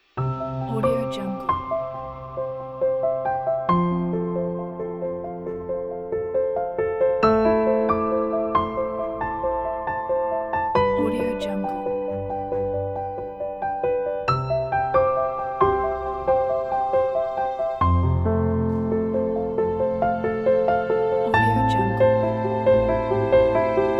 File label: romantic valentine piano and cello